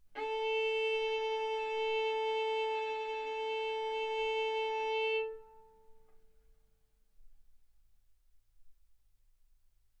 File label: Violin arco A4 B4; mf; sul A; stereo